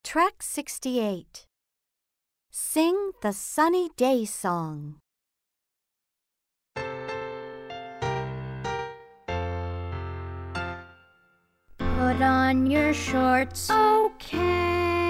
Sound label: the sunny day song slow